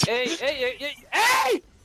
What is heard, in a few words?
eyeyeyey